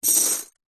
foley keys large bunch prison guard set down on carpet